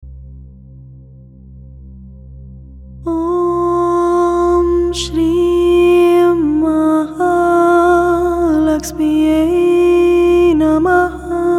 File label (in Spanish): Mantra Lakshmi; lakshmi kubera prosperidad